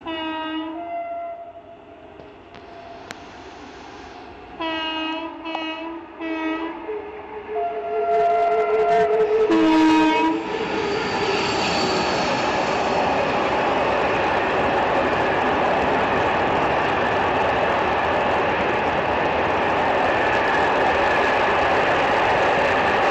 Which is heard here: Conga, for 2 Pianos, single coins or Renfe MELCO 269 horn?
Renfe MELCO 269 horn